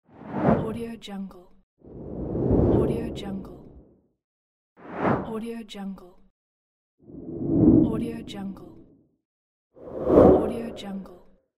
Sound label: woosh